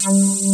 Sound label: FILTER PLS BS; Pro-Rec - Synthsonic; BASSES